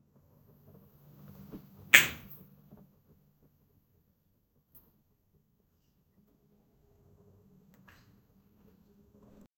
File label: a cat jumping on a; domestic animals